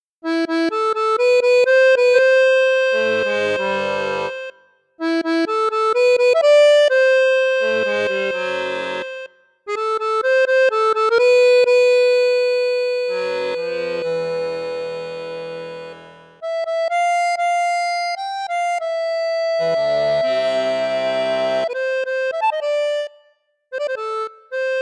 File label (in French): registre basson, accordeon; basson1; cavagnolo vedette 10 super